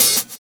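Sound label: open hi-hat, Roland MV8800 drum kit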